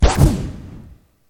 Quick Air Pop And Vanish

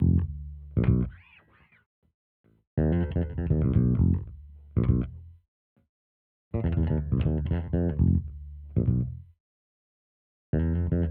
120bpm F Fender Bridge

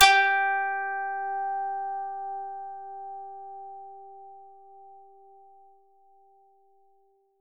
Koto G4

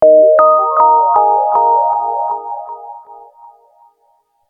Jingle

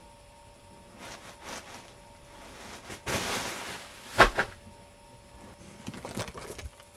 Ruffling Blankets

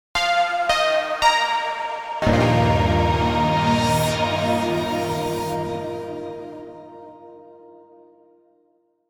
Synth Stinger

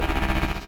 Granular

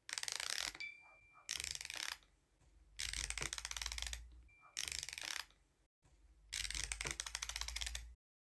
Winding Up A Music Box

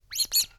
Squeak Duck

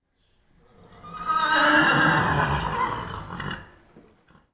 Redbarn Horse Whinny

